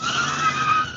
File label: braking car; BALLY; CORVETTE; Son Corvette Original